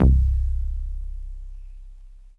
DX100 Solid Bass Vinyl Synths C1

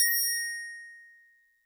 TBells DX100 As6

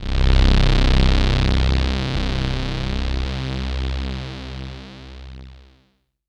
SYNTH

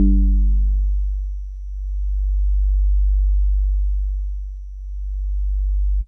Jazzy Organ DX100 G1

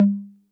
Conga Low 808 Color

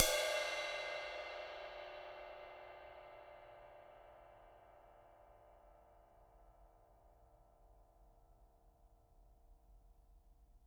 Ride Acoustic; Genesis

